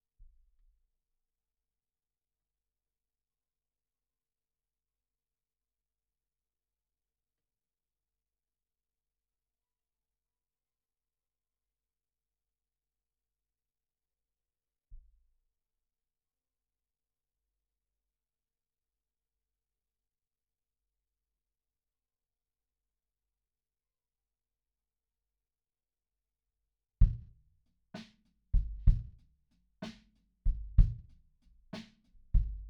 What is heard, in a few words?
Kick In